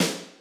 Snare D1, Roland U220

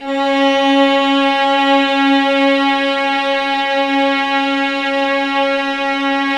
C#2, Ultimate String Collection, VLAS EXP; LEG

